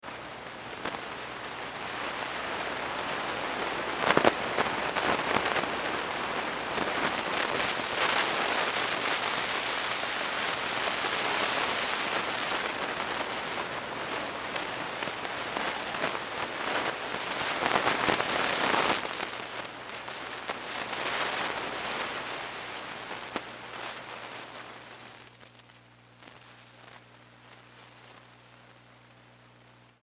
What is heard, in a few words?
Static tube amp ca1960s, Static, Tube Amp; Tube Pa Amplifier Static , Constant; Constant, Scratchy; Noise; Electronics